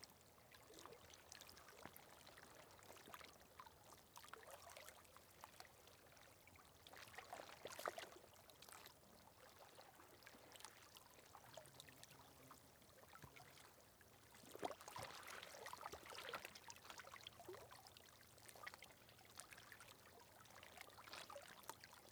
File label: water lap calm shore lake; UltimateFishing2020